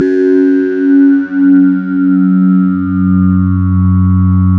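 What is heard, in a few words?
synth Scanner; Best Service Gigapack 1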